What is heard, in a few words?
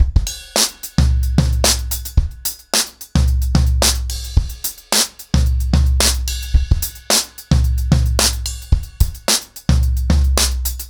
110BPM, acoustic drums, drumloops; The Roots Drumloops; Zero To Ten